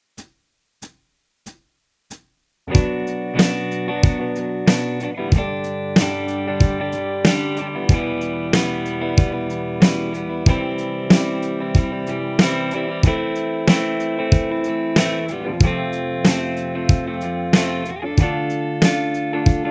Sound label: fretboard chart fast